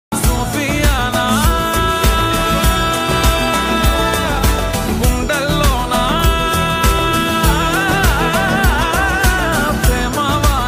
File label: Song Ringtone, Telugu; aay aay telugu movie